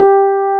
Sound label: SUITCASE, Keyboards Old School; USB Soundscan vol.09; AKAI